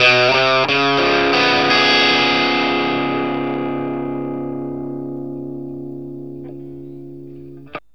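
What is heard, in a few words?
PICK C sharp 7, Vintage Blues Guitar; USB Soundscan vol.22; AKAI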